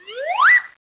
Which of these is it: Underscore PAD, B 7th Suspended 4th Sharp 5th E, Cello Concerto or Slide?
Slide